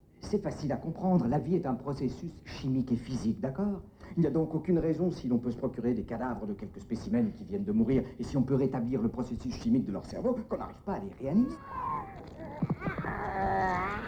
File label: Punk Triso Trash intro